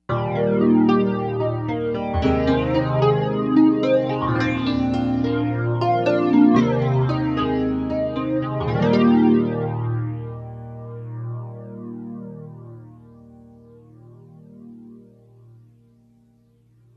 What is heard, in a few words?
Stereo Chorus Max Phase Eb